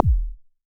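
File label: kick, 5U Modular Drums; Polyend; Simulacrum Sounds; 1 Shots; Synths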